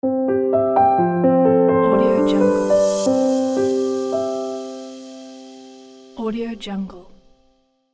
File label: soft logo; eliansproductions